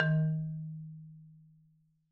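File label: Marimba medium F D#, marimba; Sampleheads - Dave Samuels Marimba & Vibes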